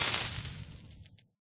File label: lava death; Minecraft